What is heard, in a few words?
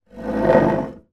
household chair wooden drag hard floor; zapsplat